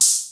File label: Hi Hat, Cwejman S1 MK2, Drum Machines; 300 Drum Machines